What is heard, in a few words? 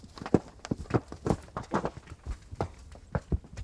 debris fall, roof